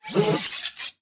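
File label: Card disassemble; Zenonzard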